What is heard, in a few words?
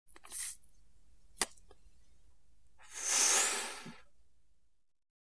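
smoking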